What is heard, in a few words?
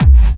KICK hardcore, Techno Trance DRUMS